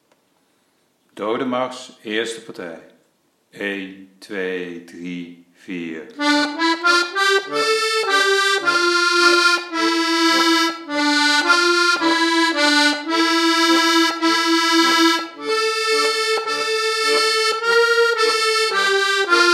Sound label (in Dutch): doode marsch eerste partij